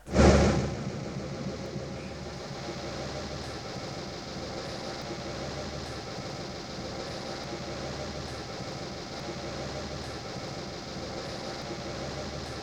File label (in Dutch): Motor aan